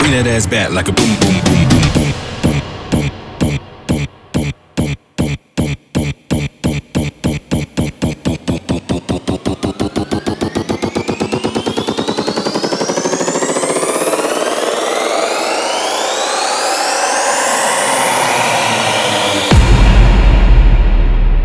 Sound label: boom